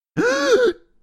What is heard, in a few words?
gasp